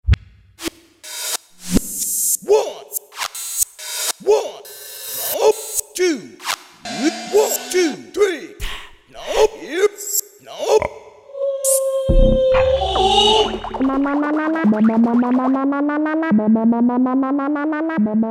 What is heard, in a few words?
RHYTHM FX, Factory Rhythm Sets, Roland SC-880